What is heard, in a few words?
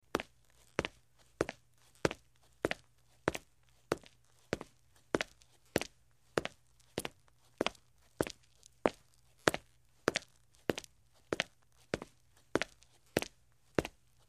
Footsteps Concrete